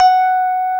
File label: Rhodes; Best Service Gigapack 1